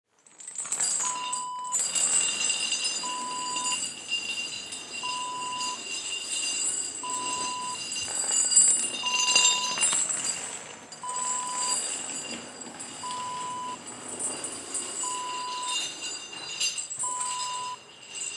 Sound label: chain dragging